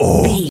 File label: gorilla hurt